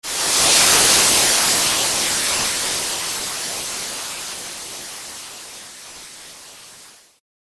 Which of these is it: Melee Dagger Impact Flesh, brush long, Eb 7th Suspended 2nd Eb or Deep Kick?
brush long